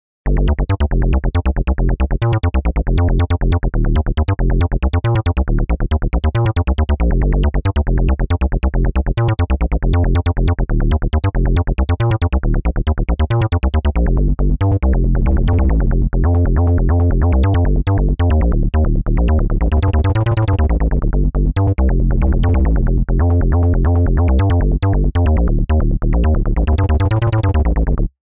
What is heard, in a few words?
bass